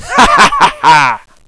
laugh; bot; cooked

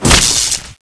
stab

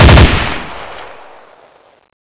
famas burst

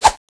slash

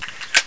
amg foley

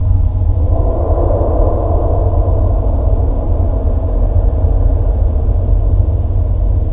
gloomy, ambience

